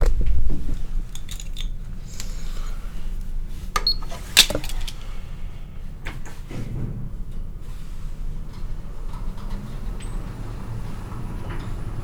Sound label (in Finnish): hissilla ylos ovi auki kaksi ovea